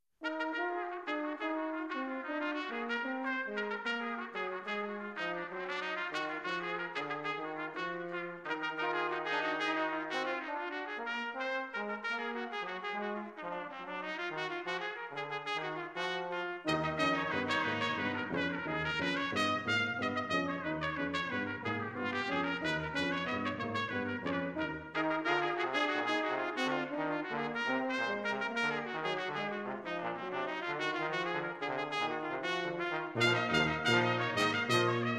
brass, blues

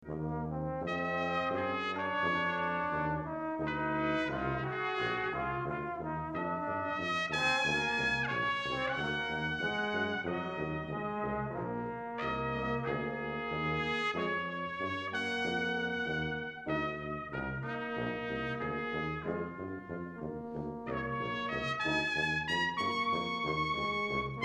Slow Crusin Blues, Brass

